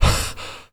breathing; Call of Duty Modern Warfare

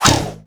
kiting whip light

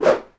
Axe Swoosh; Civ4 warlords

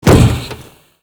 bodyfall tin roof

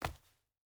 footstep burlap concrete jump land; Rust

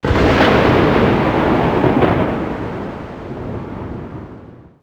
thunder

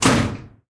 cockpit close; War Thunder

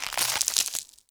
pick ice cracking stop

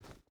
footstep rubber boots dirt jump start; Rust

